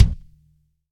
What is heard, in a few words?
Kick